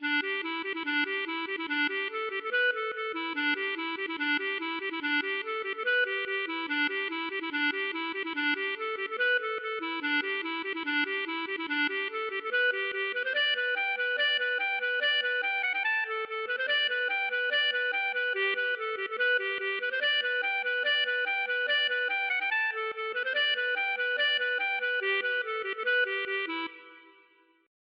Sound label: polka